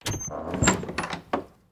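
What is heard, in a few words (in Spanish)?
ABRIR PUERTA, open door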